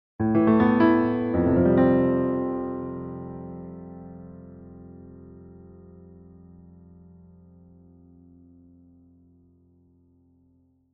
Evening News Theme Stinger

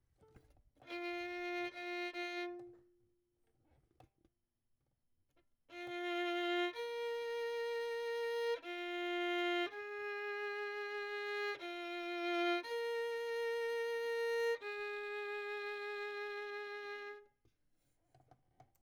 Violin; Verdigris Music

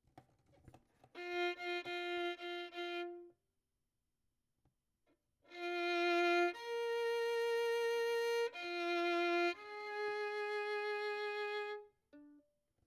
Violin; Verdigris Music

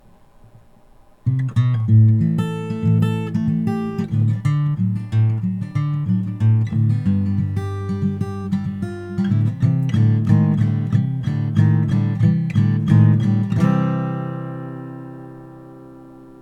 Instrumental Outro; Copper Camp Audio Book

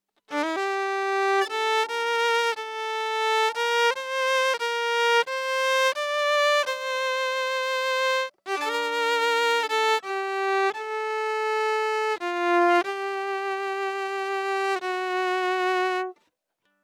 Violin; Verdigris Music; backwards

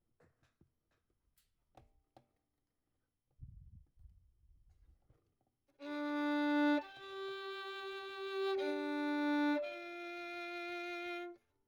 Violin; Verdigris Music

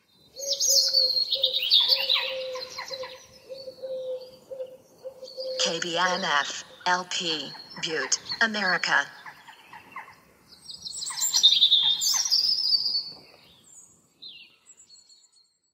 Birds Legal ID; STATION IDs

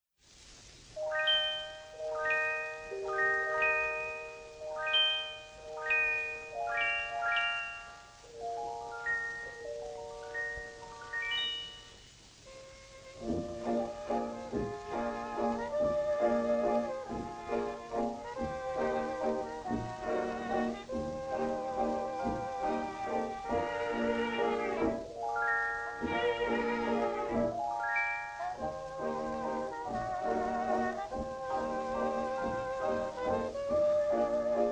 Waltz

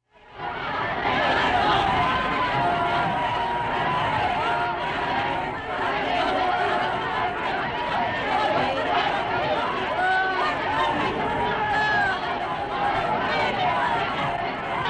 Angry Crowd

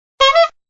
honk sound effect

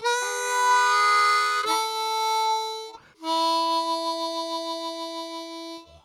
harp; Verdigris Music

